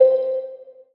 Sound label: PLUCK Over (C); Melodic One Shots; Cymatics; ANALOG EVOLUTION Beta